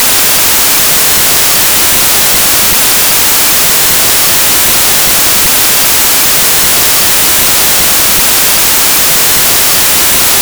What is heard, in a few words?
MLS, Impulse Responses